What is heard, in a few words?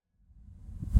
Photo General Place